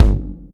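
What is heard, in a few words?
bass drum, left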